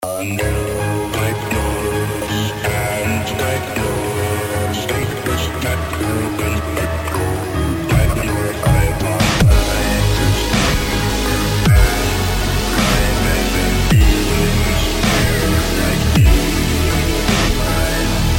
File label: Metal steampianist